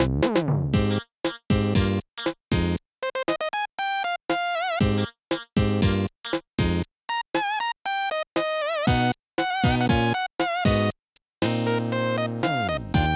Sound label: Disco, Aircheck, Retro